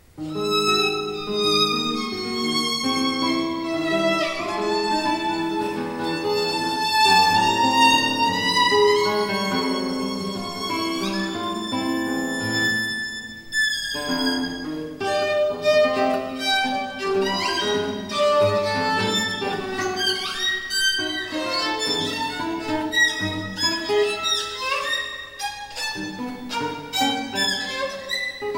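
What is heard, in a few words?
gtr vln, guitar violin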